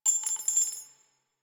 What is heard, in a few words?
Sniper Shell Casing; DE; Warframe; Frumentarius; Exalted Sniper